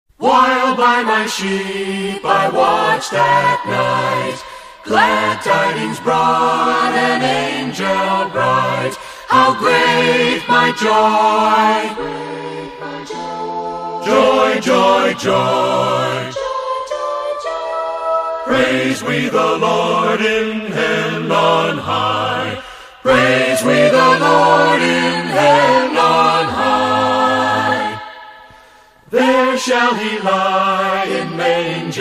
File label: choir; christmas music